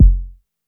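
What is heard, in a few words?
Club bass drum, Jomox Airbase99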